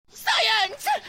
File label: singe